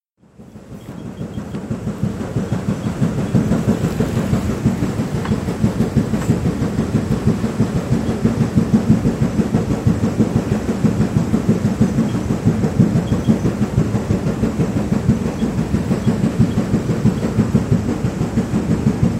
Fast paddle beat; Sea Reach

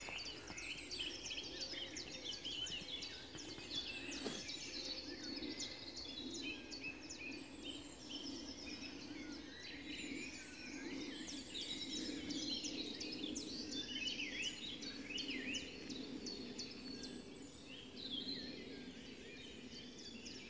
Dawn Chorus

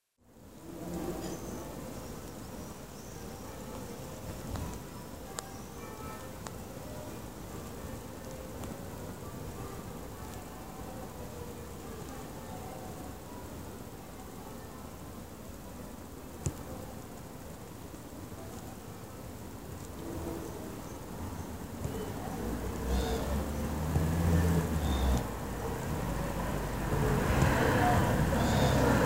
Bells

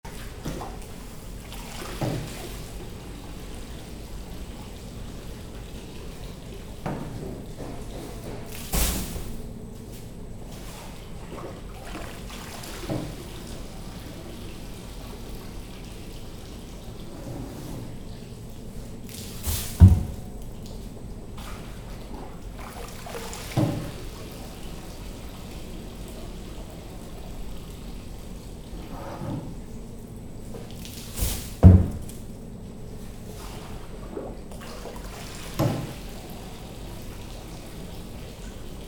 Shoveling Salt

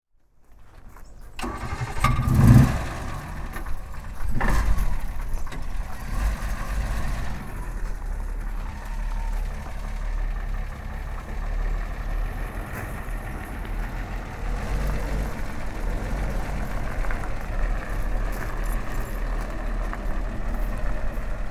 Bedford Duple

